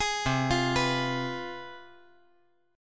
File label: C Major 7th Suspended 4th Sharp 5th, down